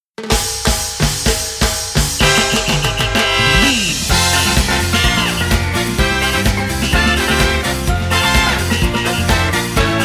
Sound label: Instrumental